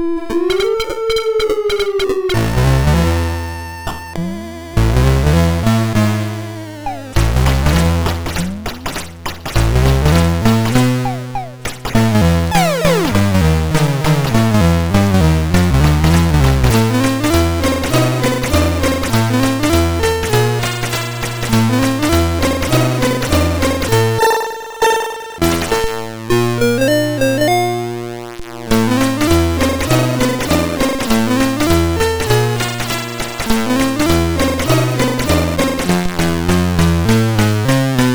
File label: sid MOS6581R2; hvsc